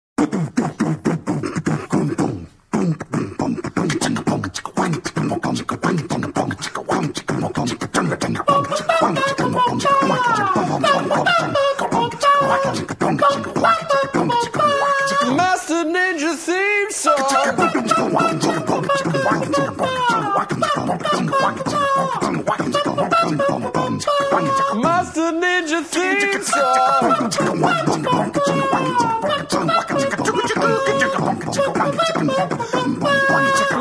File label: Snap